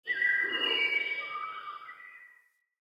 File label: Wetland Forest Day Creature Distant; Midrath Island; Ambience